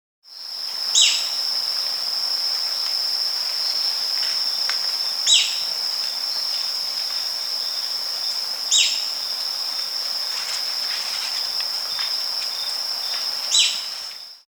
Yellow-Throated Spadebill (Platyrinchus Flavigularis), Andean Birds; S. America